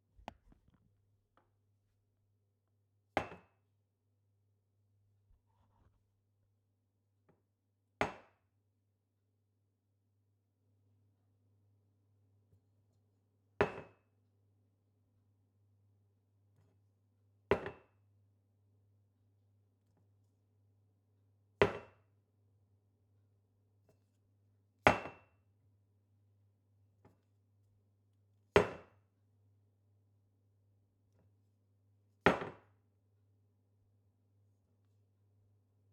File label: Bottle Puts On Table